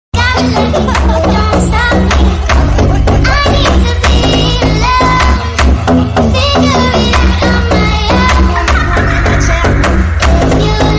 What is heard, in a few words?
FUNK Sped Up